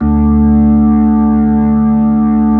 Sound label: B-3, Full Xfade; Roland LCDP10 Keys of the 60s and 70s 2